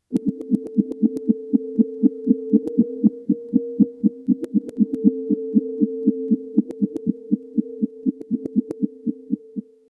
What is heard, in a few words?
haptic sound heartbeat